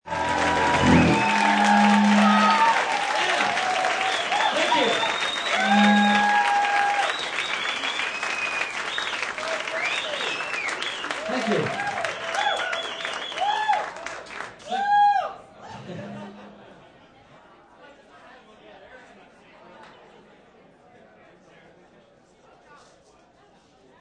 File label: Group Cheer Bar, Cheering, applause & bar ambience, group, microphone feedback, sound of music end; Crowd Sound Effects